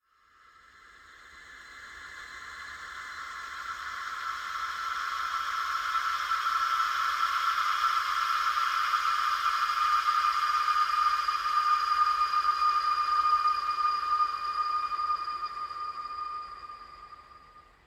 Ghost; SyrinScape; Clockwork entities

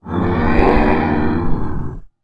moan; The Elder Scrolls III - Morrowind

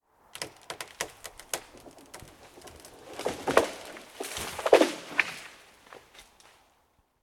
Treefall Quietish; SyrinScape

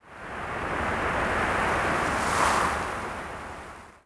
wind TREE; The Elder Scrolls III - Morrowind; Morrowind GOTY GOG

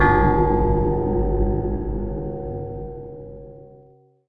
bell; The Elder Scrolls III - Morrowind; Morrowind GOTY GOG

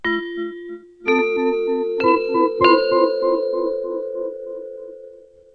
Mysticism Cast, magic; The Elder Scrolls III - Morrowind; Morrowind GOTY GOG

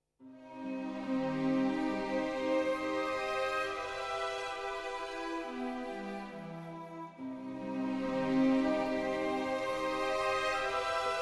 no choir

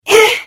Hit; The Elder Scrolls III - Morrowind

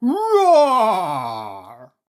dino roar; SyrinScape; Syrinscape unplugged; Jungleplanet dinolaser battle

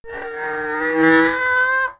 scrm; The Elder Scrolls III - Morrowind

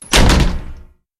Closing Door; SyrinScape; Hunting horror battle

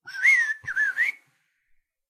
Whistler bird

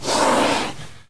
moan; The Elder Scrolls III - Morrowind

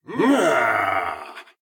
Combat; Eshimal; SyrinScape; Snarl and Oraculum

